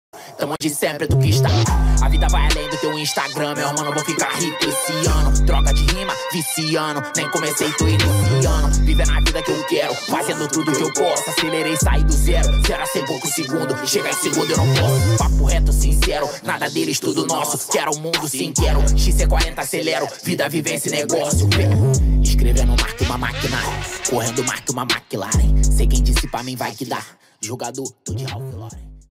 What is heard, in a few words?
Hip Hop